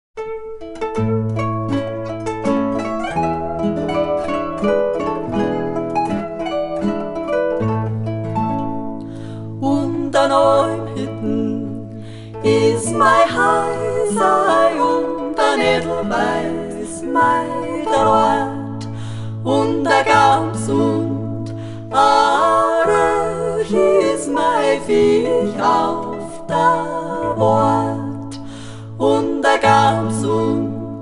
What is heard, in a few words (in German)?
Volksmusik